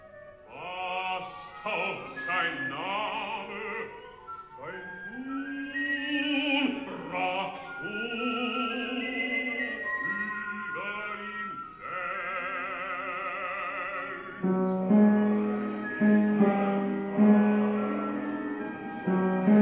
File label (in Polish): tenorzy; cardillac